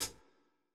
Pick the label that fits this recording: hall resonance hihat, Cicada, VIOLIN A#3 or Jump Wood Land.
hall resonance hihat